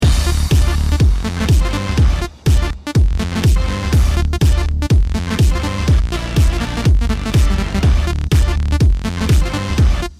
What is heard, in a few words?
remix; blackbeard; Pirates; On Stranger Tides